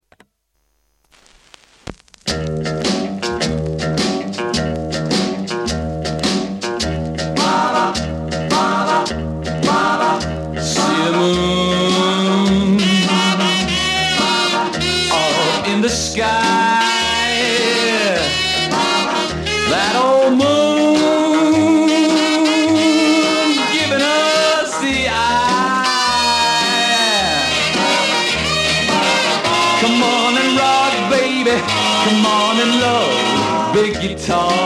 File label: Big Guitar